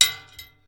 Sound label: Shell 39mm metal; STALKER; IWP